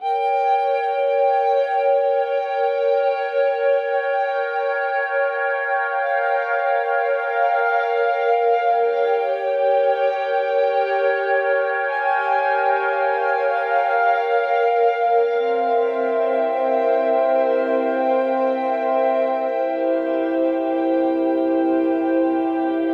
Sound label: Cello Bowed